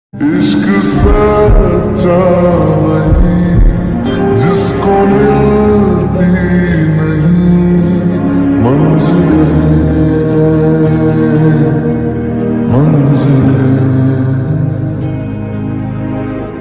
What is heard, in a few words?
slowed and reverb lofi song; aesthetic whatsapp status